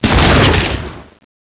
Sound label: Explosion Sml; XWing